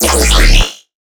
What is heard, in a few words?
Bass Growl Flaming 200 C#; exciplex sample presets pack; JinoBeats